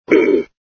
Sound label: SFX sound (baron smacked); Red Baron